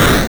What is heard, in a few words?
Door; glitch in the system; godot 4